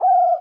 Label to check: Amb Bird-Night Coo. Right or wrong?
Right